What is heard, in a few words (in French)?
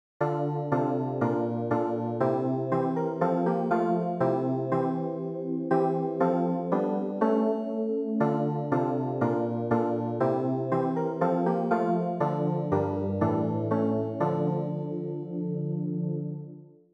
CLAVIER